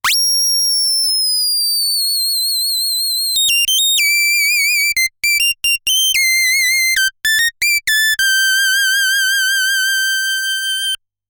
DSI Mopho Keyboard Solo

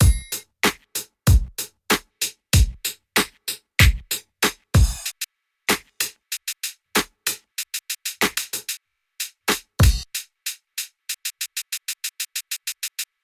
freeze Loop

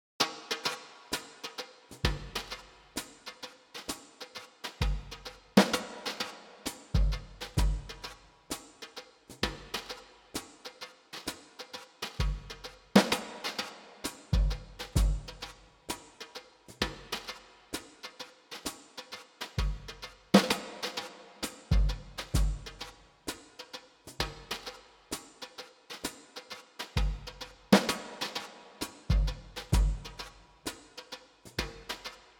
SuperPlate Drums Clean Mix50 Decay 4s; Stocktronics

